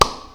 Clap Hollow

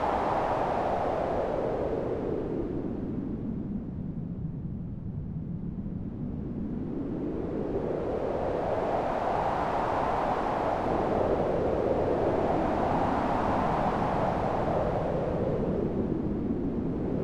Background Wind

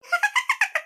Very Cute Cartoon Laughter

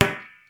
Rubber Ball

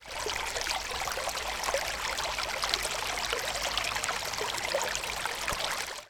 Water Flow

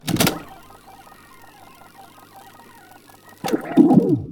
Reel To Reel Fast Forward

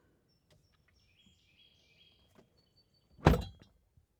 Car Door Closing From Inside

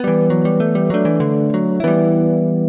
Bard Melody

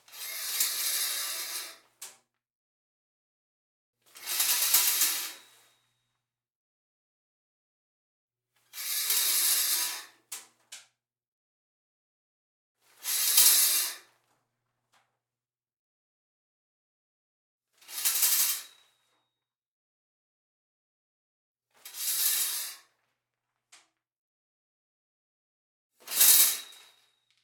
Household Curtain

